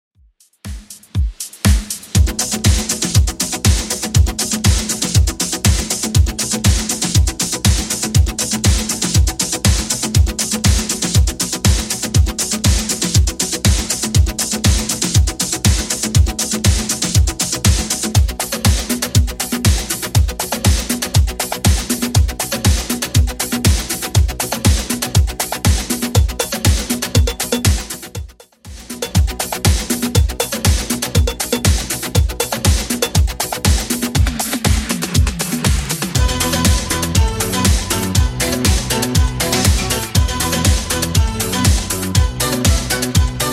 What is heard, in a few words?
80s Redrum 128bpm